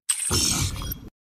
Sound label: Menu Pop; Solo Leveling